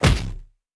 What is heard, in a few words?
Stun